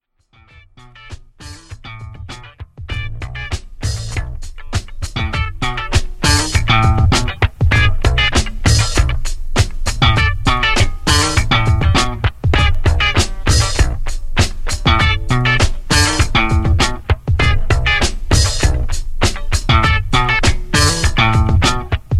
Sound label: rainy sunday jam, retro